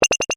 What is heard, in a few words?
Beep Electronic, Scifi, Beep, Electronic, Science, Fiction; Tech Sound Effects; BlastWave